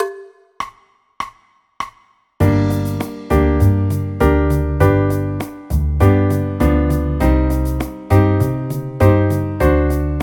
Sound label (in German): g moll akkordverbindungen